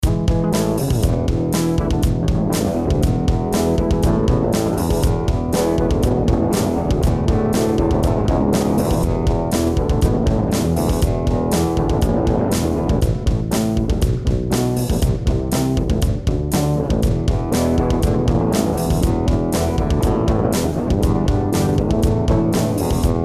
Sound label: 8 string passive mode bridge pickup pick, Bass; ShamrayBass